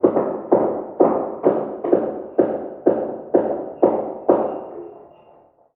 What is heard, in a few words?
Random Double Hammers; moul